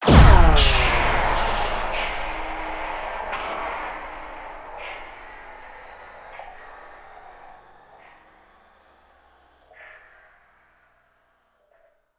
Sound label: underwater torpedo launches whistling sound